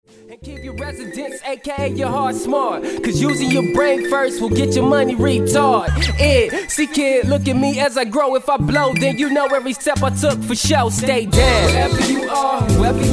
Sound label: new rap songs